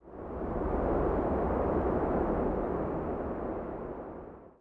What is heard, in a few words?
wind DES; The Elder Scrolls III - Morrowind; Morrowind GOTY GOG